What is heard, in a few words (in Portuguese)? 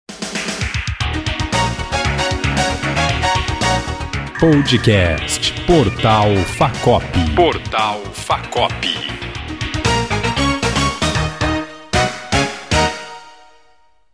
vinheta